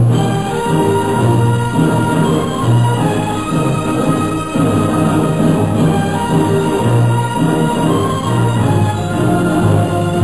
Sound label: tune; chaos